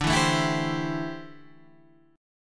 D 7th Suspended 2nd Suspended 4th D, Guitar Standard; D7sus2sus4 strummed